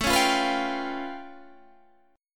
A Augmented 9th A, Guitar Standard; strummed; 17,16,15,16,18,15; JGuitar

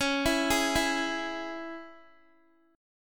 C sharp Minor down Guitar; C#m arpeggiated

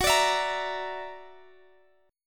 Gb Augmented 7th, down, Guitar, Half Step Down

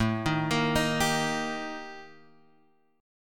Eb Major A; arpeggiated; JGuitar

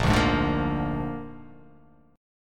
Bb 7th Bb down, chord; strummed; JGuitar

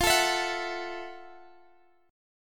F Major 7th Flat 9th; strummed; chord; FM7-9; JGuitar

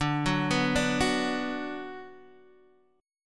D 6th D down Guitar Open D, chord

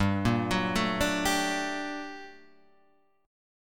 G Minor Major 9th, guitar chord; GmM9 Chord {3 1 4 2 3 3} arpeggiated

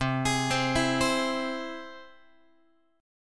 C Augmented C down Guitar; arpeggiated; x,8,11,10,8,11; 4A,4E,5A,5D,5G#,6C#; JGuitar

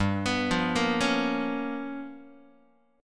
G 7th Sharp 9th Flat 5th G chord, Guitar; G7#9b5 arpeggiated; JGuitar

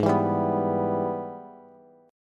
Minor Major 7th Sharp 5th, Bass; G#mM7#5; strummed; 16,19,17,16

